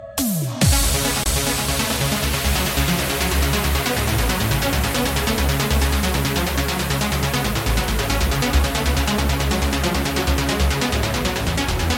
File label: Trance